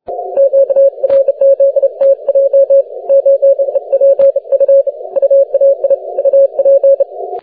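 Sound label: 40m continuous wave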